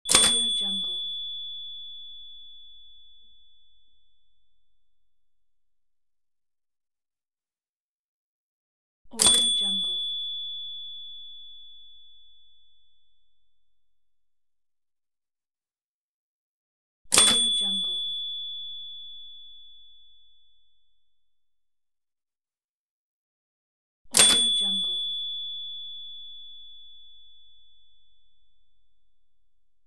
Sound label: typewriter end of line bell ring